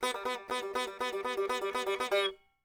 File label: sitar